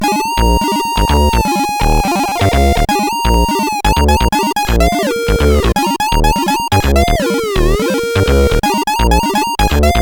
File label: Blues, CSG8580R5; Spike Super Blues Experience